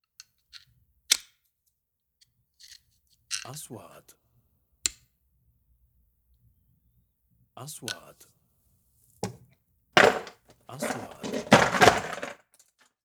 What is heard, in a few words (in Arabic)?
زرادية; sound effect